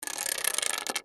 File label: Dominoes Fall